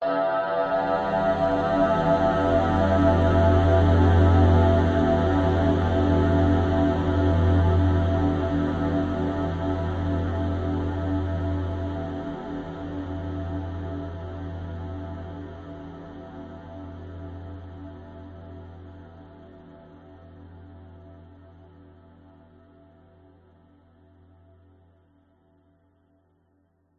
horror hit chime into long airy decay evil cold scary sinister; zapsplat